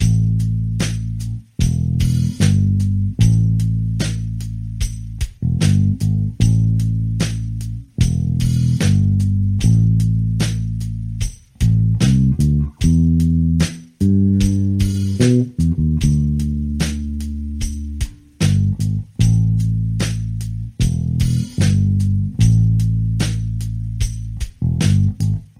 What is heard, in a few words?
bass lines